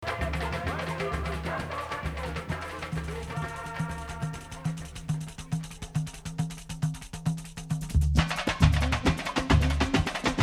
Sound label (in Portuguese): Batucada